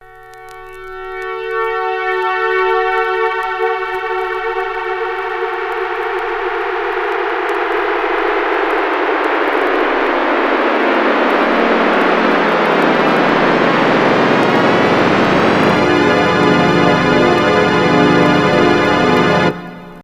Synclavier II

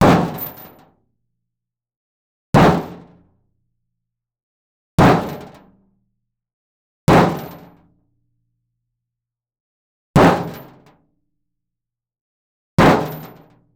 Metal Bang Axe + Chains; Collins Axe; Soundopolis; Heavy Metal!

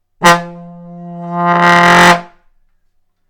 Trombone fluttertounging

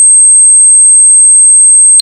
Audio frequency tone, C9, 8372hz

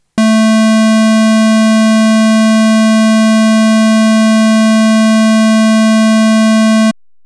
Square

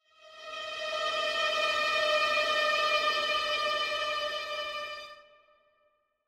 Fear

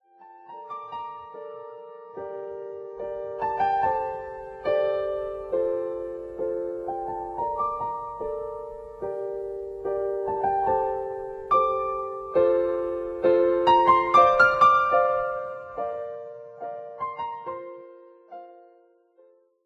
Piano; Final Fantasy VI Piano Collections

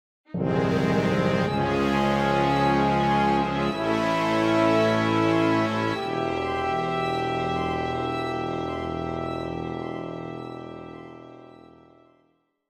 Long drama

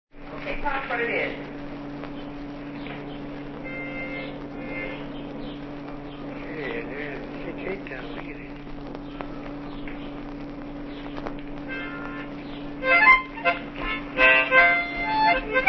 Mazurka

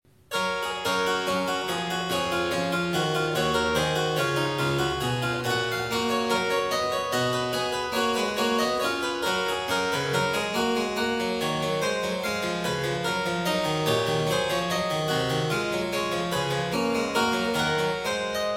Goldberg Variations, Variatio 2 a 1 Clav; J.S.Bach; BWV 988 Variatio 19 a 1 Clav

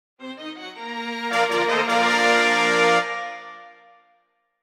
Trumpet call light

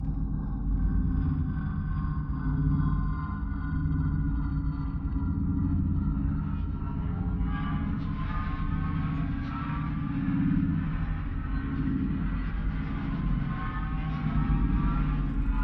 Abstract Ambiences Mix; SoundBits

